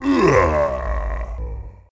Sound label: ogre die; DAoC